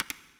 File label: click; musicbox